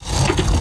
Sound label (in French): levier